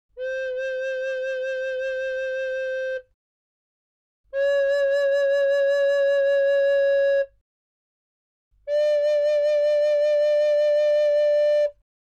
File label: AirReed Record; 気鳴楽器; Recorder